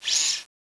idle, weapons; cso

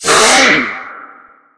spider ouch

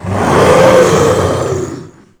golem low health

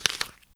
eat

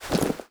shove

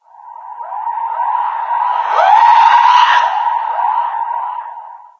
chatter, lostsoul; doom3

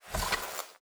arrow fetch, bow; bow deerhunter

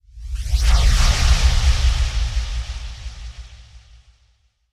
blowout flare

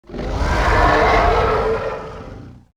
dragon voice

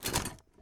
armor

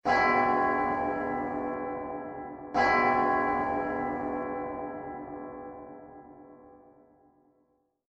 bell choke, monastery; soundscapes

